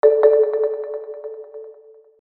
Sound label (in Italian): Grilletto; Vertu; Signature-Touch